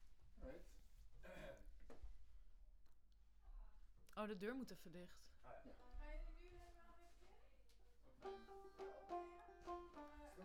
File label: Voc Fig8